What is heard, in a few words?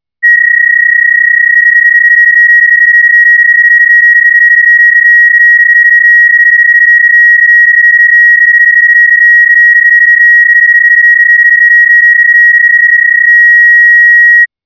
PSK31